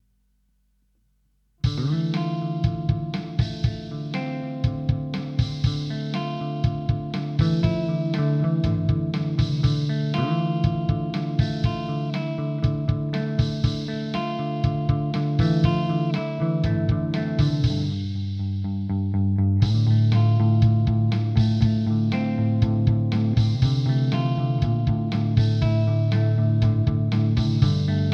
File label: Drum Loop; Boss RC-500